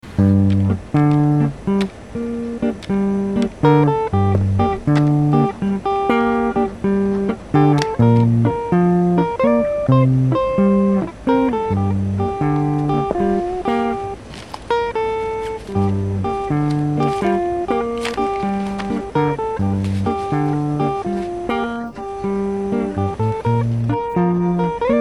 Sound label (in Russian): норм музыка релакс солдаты accompaniment G minor 126bpm 443hz